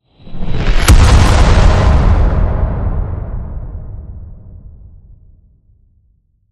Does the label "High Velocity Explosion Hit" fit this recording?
Yes